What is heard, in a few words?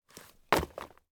Landing On The Ground